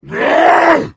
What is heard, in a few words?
attack; tf; slender; moarg